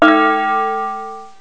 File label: tibeta, fareast